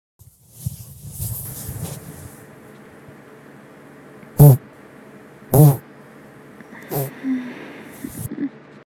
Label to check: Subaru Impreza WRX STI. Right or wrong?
Wrong